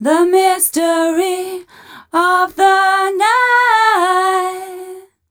f 132 mel, Vocals, fem

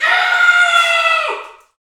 Bullhorn, Afro Horns, Africa

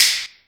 Finger snap; EMU Orbit-3

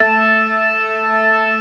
STOPS, ORGAN; Keith Emerson Signature Series

